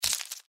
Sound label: mantis foot; npc